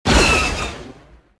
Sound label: fire, weapons; atst